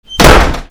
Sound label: DOORS